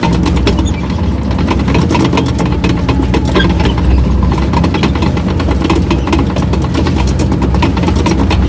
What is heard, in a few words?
tracks light speed, tanks; engines; War Thunder